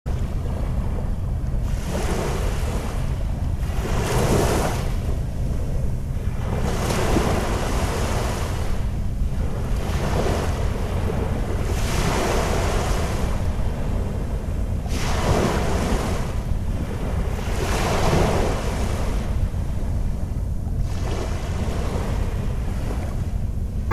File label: wave sound; akashi